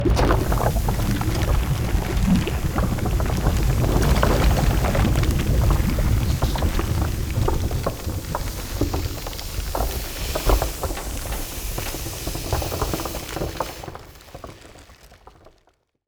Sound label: mountain forging; Stellaris; strange worlds